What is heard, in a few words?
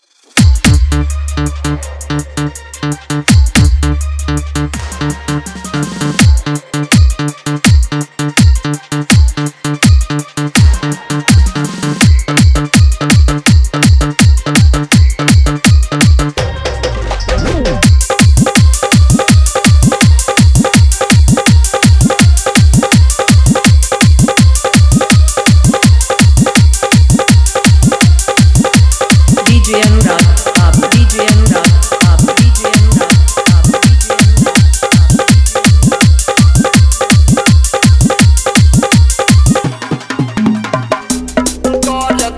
Full Dhollki Hard Bass Dance Mix